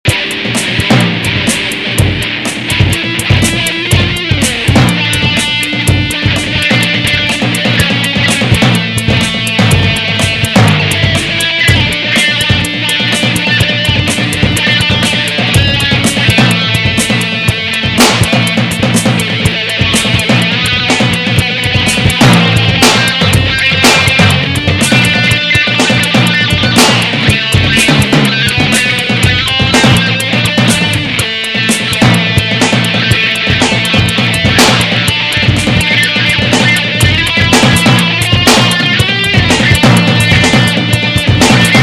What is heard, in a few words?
jungle refrain